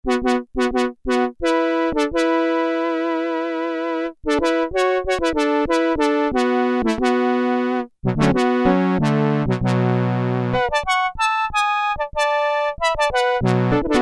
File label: Korg Poly-800, Brass in 5ths